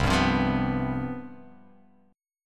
C Major 7th Suspended 4th

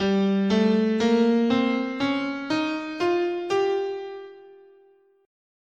G Locrian sharp2, scale

